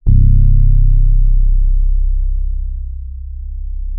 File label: RHODES MK1M C1; Xsample VOL-1